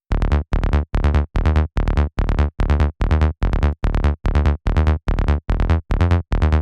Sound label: Bass Dark, PsyTrance; LiLA'c Records PsyTrance Sounds 01